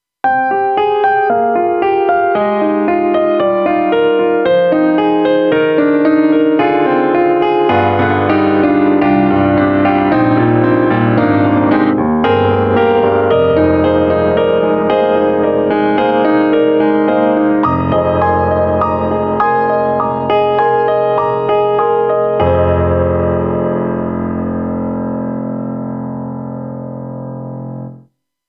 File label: Korg M3 Upright Comp Piano; XPanded